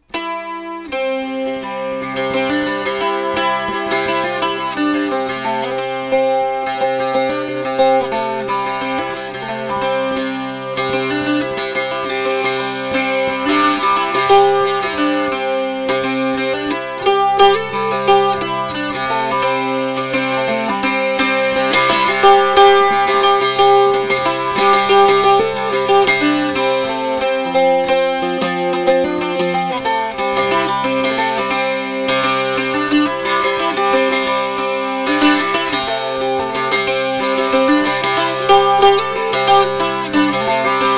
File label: Dulc, appalachian dulcimers; Here are some images and sound clips of recent dulcimers